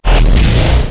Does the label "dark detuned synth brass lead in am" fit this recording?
No